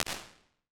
Drum Plate, impulse responses, plate reverbs; Small Spaces; Apple

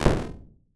Room Next Door, impulse responses, warped reverb; Small Spaces; Apple

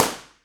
Room Near, Impulse Responses; Small Spaces; Indoor Spaces; Apple

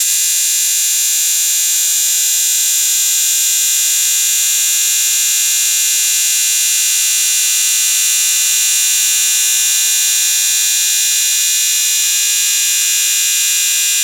Sustained Cymbal; Logic Pro; MADSP

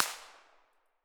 Hi Woods Natural; Medium Spaces; Apple

